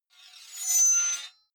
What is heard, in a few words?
sword blade screech high